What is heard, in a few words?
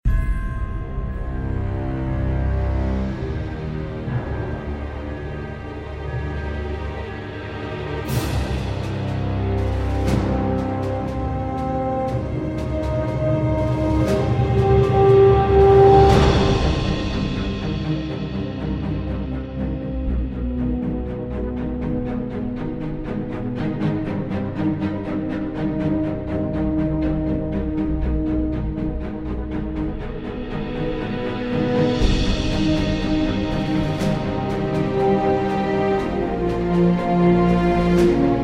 Tension Theme; Galaxy Control